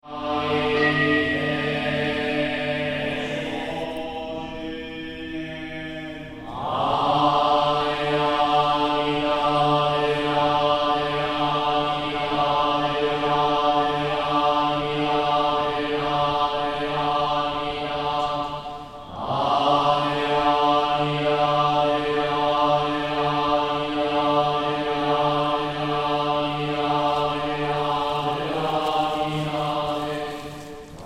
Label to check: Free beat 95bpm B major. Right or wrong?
Wrong